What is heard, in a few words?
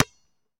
Street Ball Bounce Blacktop, Street Basketball; SFX Kit